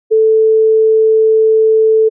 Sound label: puretone 440hz